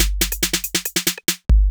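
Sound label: DRUMLOOP ELECTRO 140; Techno2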